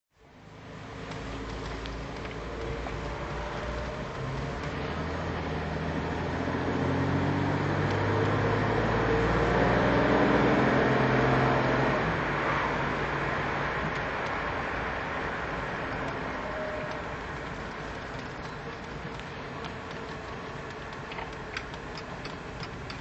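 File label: daimler sovereign drive, engine sound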